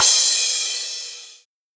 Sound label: EDM Future Crash, Cymbals; Big EDM; Future House Tools